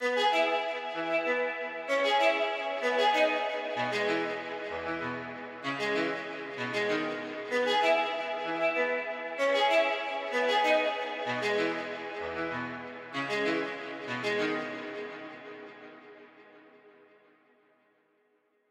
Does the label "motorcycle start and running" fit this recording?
No